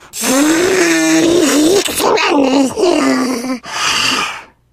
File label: spitter lurk, voice idle; source engine; left 4 dead 2